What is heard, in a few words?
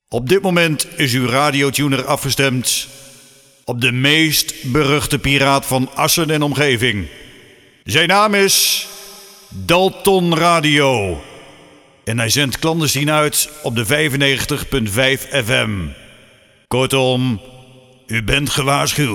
Jingle; Audio Fragmenten; Arkan Radio 2